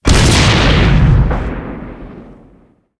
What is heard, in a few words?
Engine Explode; timez attack